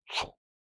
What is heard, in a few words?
Human Whoosh Fast; Human Whooshes